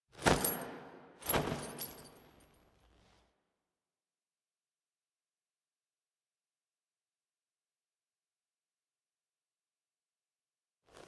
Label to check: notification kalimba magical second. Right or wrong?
Wrong